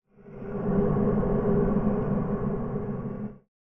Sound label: Soft Ghost Voices; SoundsCrate